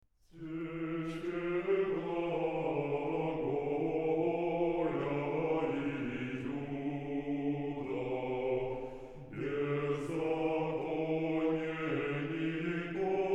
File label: Choir; State Capella Choir of St. Petersburg; Reading the Gospel